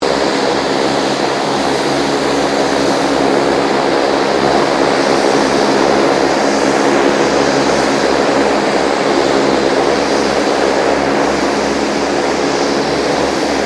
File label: AIRCRAFT 2007 KING AIR 350 IDLE; RAAF